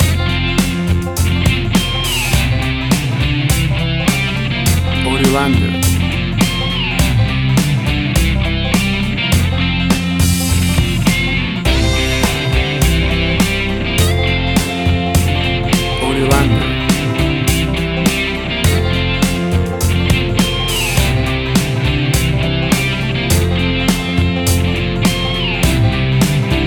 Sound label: Blues Riffing